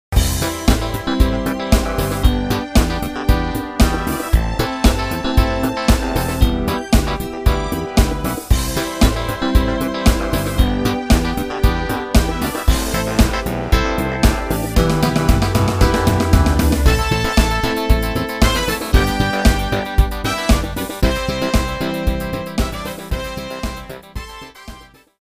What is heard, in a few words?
bass